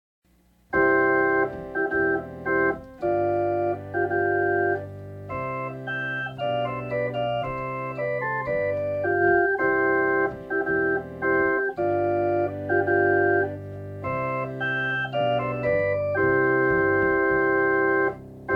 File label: Grand Choir Postlude